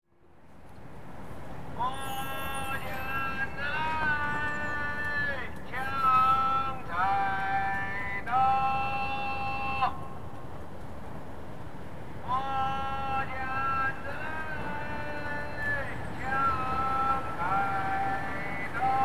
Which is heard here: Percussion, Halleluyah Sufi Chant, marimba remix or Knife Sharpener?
Knife Sharpener